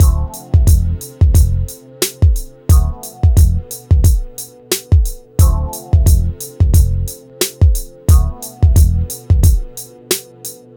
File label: Hip Hop and R&B Drumloops, mix; Best Service ProSamples vol.01; AKAI